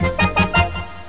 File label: SUCCESS